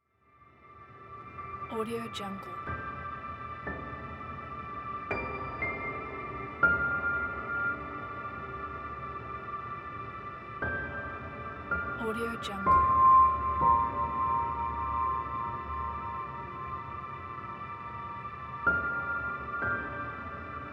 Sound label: game menu; absinthemedia